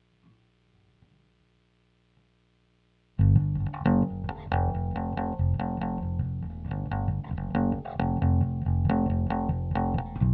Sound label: Bass